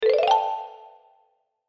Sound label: Marimba Accent; Cartoon; Musical; Cartoons; Blastwave